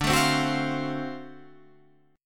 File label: D 7th Sharp 9th, Guitar Half Step Down